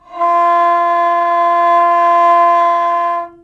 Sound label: rebab c6